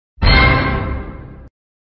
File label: funny hit sound